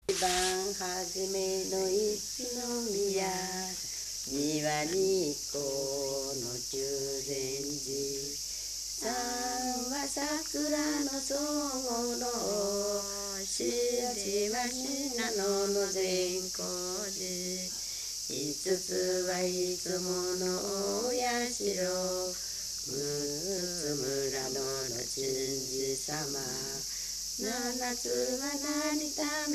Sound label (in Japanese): itibanhajime, minyou; 一番はじめ; 佐倉市